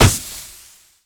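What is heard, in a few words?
destruction bag flour; Explosions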